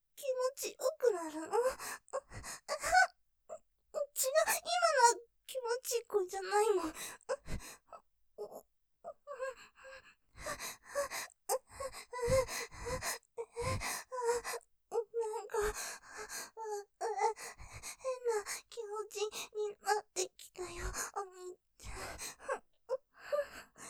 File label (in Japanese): 喘ぎ◆愛撫でどんどん感じちゃう・恥ずかしいけど感じちゃう; ステレオ